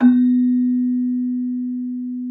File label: Celesta H3; Xsample VOL-8